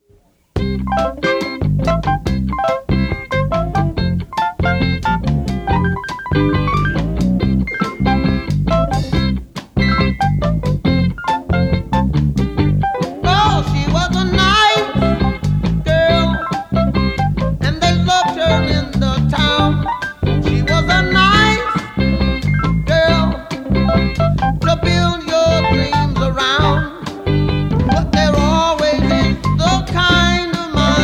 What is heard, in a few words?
reggae